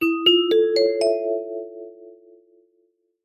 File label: musical retro classic vibraphone mystery tone; zapsplat